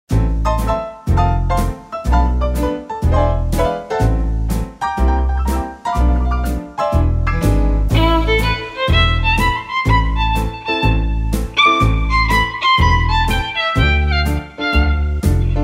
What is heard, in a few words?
swingshift